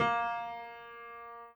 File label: piano